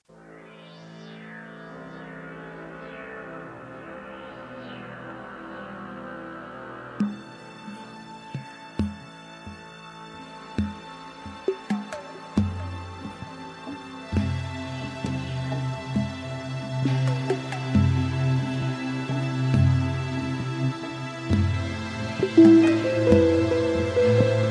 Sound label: ambient with nature; mowa serca